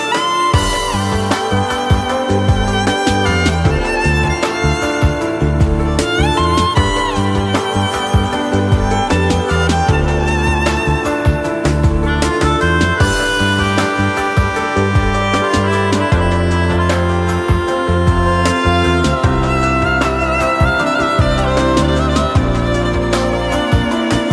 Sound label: Violin